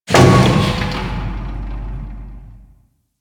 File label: Settlement Machine One Off; Ambience; Warframe; Grineer Settlement